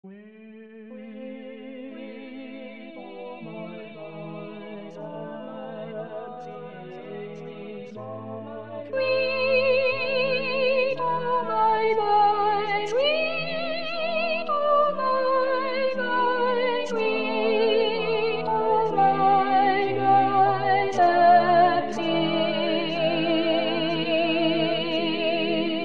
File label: soprano weep o mine eyes